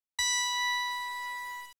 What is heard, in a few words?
signal; OutRun